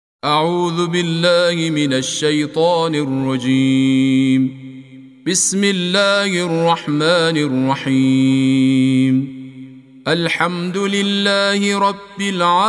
تلاوت ترتیل قرآن کریم سوره حمد

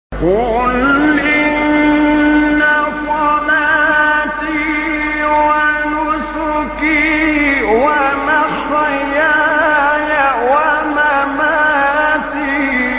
تلاوت آیه سوره; 162; انعام; استاد طبلاوی

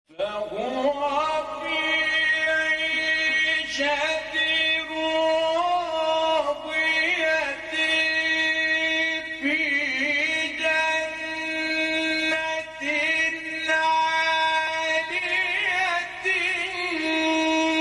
تلاوت زیبای از سوره مبارکه الحاقه; متولی عبدالعال

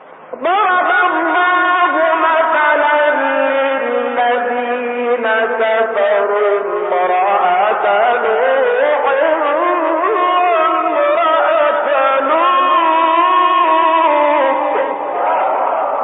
تلاوت آیه سوره تحریم; آیه 10; شعبان عبدالعزیز صیاد

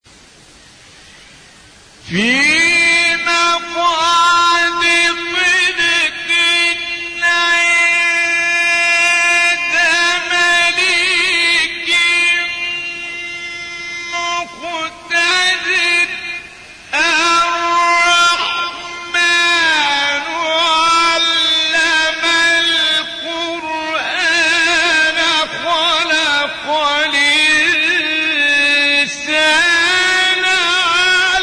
فراز زیبای ابتدای سوره مبارکه الرحمن

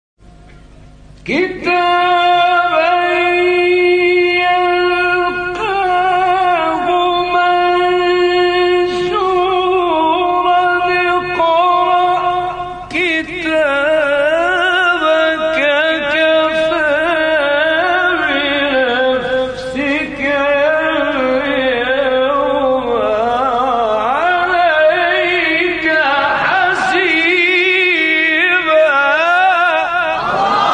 تلاوت زیبای آیه سوره; 13 14; اسرا; استاد غلوش

